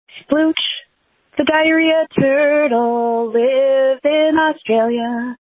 singing splooch the diarrhea turtle lives in australia